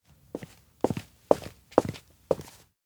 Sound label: steps indoor exit back; meguriai; fumiya; anemoi パッケージ版 1.1